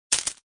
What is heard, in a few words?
add chips; PCPlaza